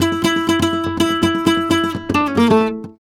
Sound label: Remates De Pulgar, WAV Guitar, Flamenco